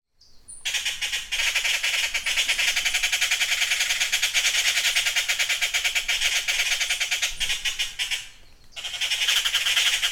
birds jay dawn forest; felix blume

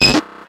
Glitch Dirt; soundbits

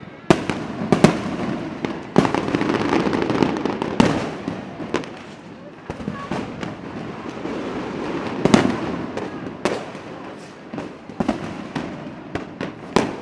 fireworks mixture urban; sound effects one

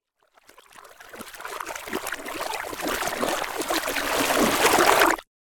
fantasy spell water movement reversed

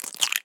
Action - Cracking Egg; Sound Ex Machina

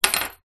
sport whistle metal place down on wooden table

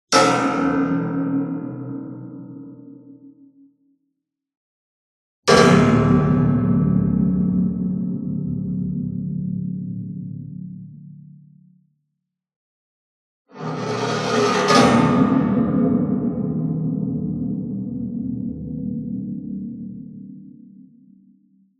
CARTOON PERCUSSIVE Percussion; smartsound

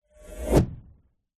magic spell whoosh powerful energy pass electric

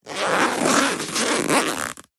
foley luggage rucksack backpack pocket zipper; zapsplat

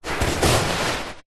hand movement scrape carpet; zapsplat; foley

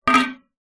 tin small empty no lid down on hard ground; zapsplat

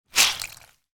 flannel wet rinse squeeze

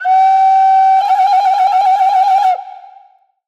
Alarm Indian Woodwind Warning; sound ex machina

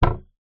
impacts wood panel loose hit vibrate; zapsplat

